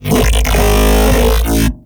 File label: Mech Ambient Vocal